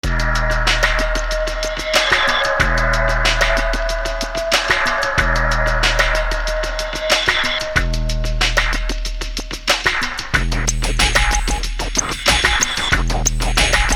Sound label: pain inst; contagion; infectant